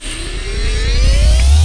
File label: Power Up; duke nukem total meltdown